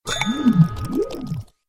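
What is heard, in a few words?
sounds of magic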